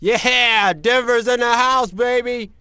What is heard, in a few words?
"Nuggets", fan; NBA Inside Drive 2004